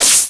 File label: ROCK